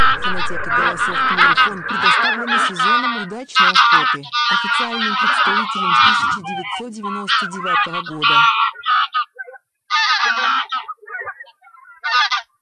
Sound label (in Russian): Гусь серый